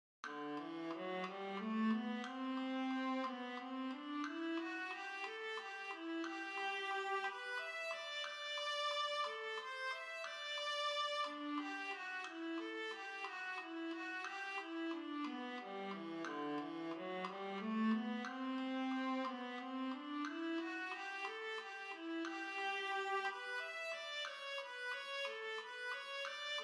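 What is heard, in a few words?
Viola, Chamber Orchestra; Wohlfahrt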